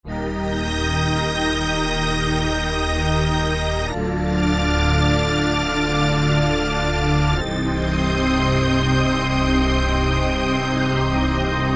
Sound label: Hyp Stringed, E-mu Morpheus; Factory Presets